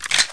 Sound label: glock slide pull